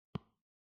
Touchscreen

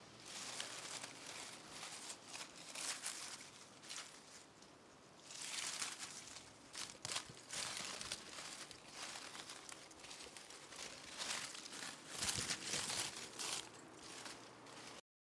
Playing In The Branches

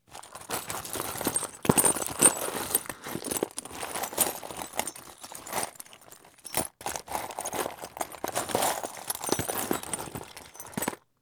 Finding Screw In Box

